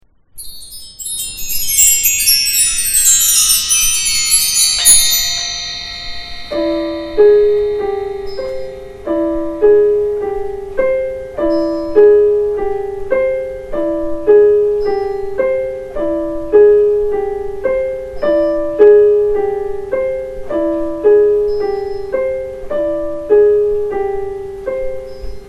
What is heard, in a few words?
Ostinato; Awareness